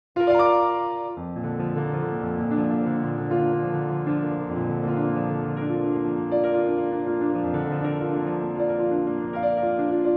Das Rheingold, Arr. Solo Piano, Entrance into Valhalla